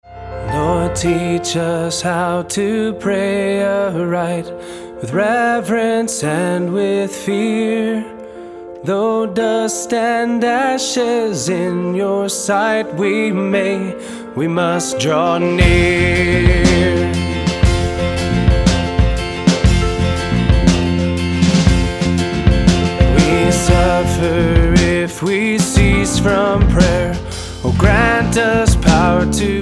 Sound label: lord teach us how to pray aright; gesangbuch